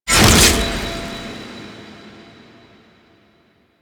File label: Sword Pull; Cinematics; Ballas; Operator; Warframe; The New War; Throne Room; Quests